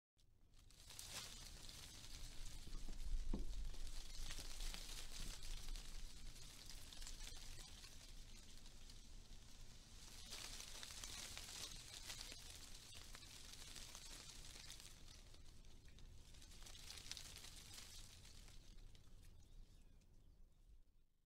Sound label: Sonido de las hojas del árbol sound of tree leaves